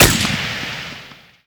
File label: Gun Shoot Fire